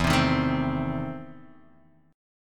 E Major 7th Suspended 4th Sharp 5th E down Guitar Drop D